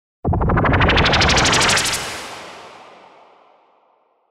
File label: Noise